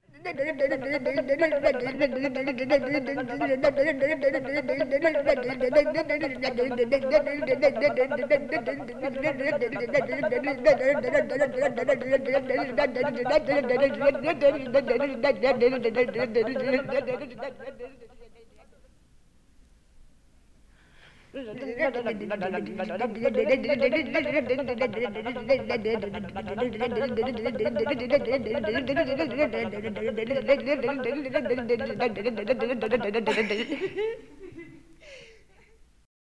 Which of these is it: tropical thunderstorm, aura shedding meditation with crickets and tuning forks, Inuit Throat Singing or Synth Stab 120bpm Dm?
Inuit Throat Singing